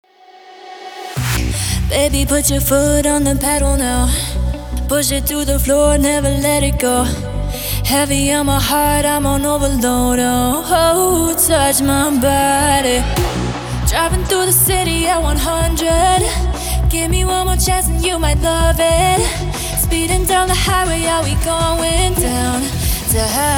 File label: HYPER TECHNO FAST